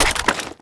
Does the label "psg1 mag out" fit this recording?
Yes